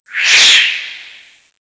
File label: dragon swoosh